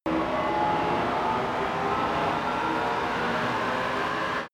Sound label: crane winch spin up